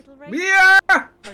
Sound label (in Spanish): grito